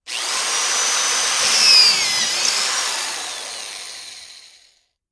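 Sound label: Electric Drill Small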